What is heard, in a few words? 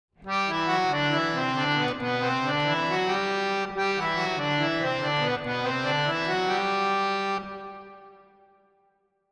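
harmonium 3-4 70 verb; Sample Banks; Harmonioum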